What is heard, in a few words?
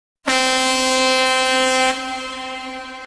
Corneta